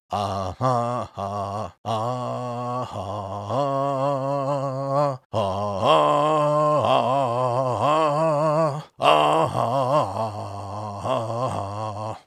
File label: NPC Sing